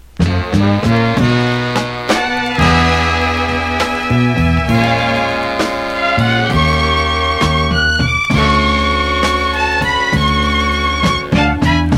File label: whispers